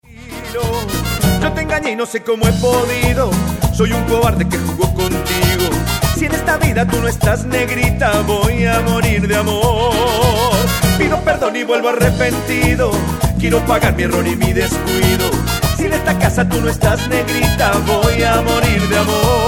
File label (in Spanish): cumbia negra pido perdon